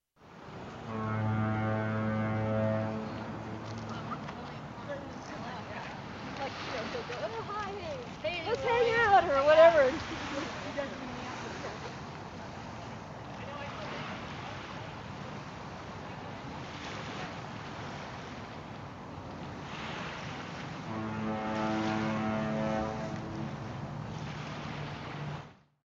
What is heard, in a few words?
a foghorn; by sam vilain